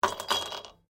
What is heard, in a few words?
Ice cubes Glass; Drink Mixing Sound Effects; Drink Mixing (Sound Effects:Bars)